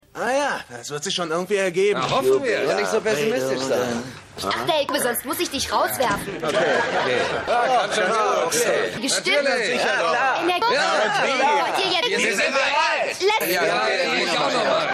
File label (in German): Mehrere Musiker; Stimmproben